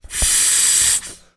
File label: lilo rubber ring blow into inflate single; zapsplat